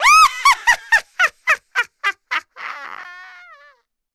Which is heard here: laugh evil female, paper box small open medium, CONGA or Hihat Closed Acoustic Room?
laugh evil female